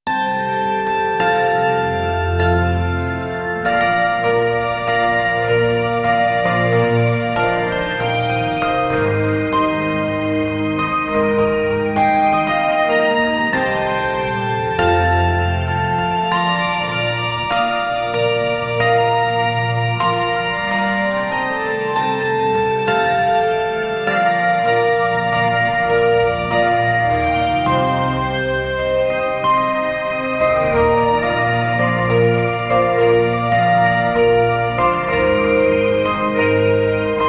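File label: soothing music in the forest, relaxation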